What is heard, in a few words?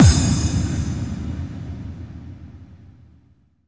BD, Dance Drums